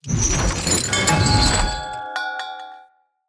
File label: Corrupted Generic Attack Foley; Bromius